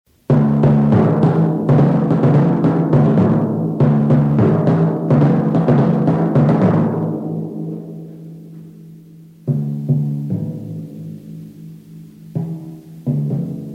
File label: tympani kettledrum; percussion instruments